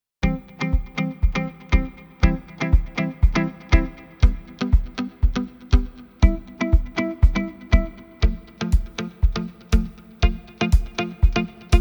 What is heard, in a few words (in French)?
musique gout rose jeune aperitif plage